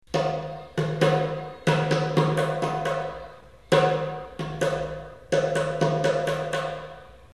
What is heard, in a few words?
djem; El José tocant la derbouka